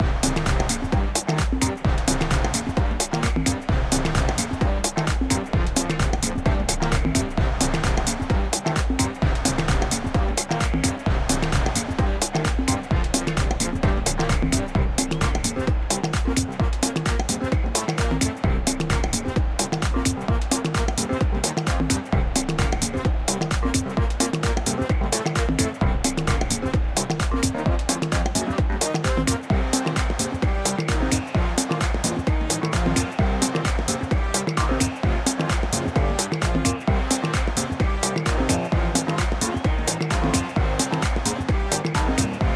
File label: ingame muzak; footbrawl